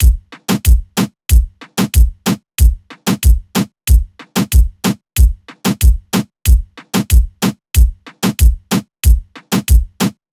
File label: Dry Drum Loop